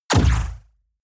sci-fi puffing burst; schusswaffen

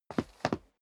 step sneaker wood parquet scuff; schritte

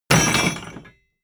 wood impact metal rattle hard

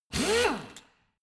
impactor soft, bohrer

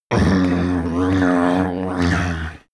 male eat feral; koerpergeraeusche; menschen; soundpool

